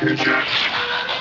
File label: eject